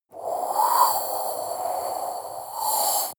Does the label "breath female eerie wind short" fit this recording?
Yes